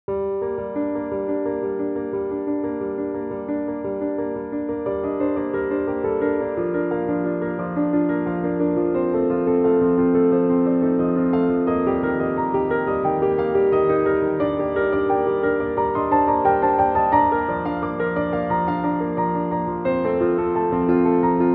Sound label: piano